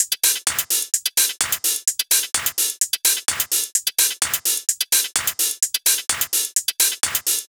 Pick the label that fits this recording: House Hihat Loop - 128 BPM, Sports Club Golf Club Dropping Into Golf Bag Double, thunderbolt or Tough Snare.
House Hihat Loop - 128 BPM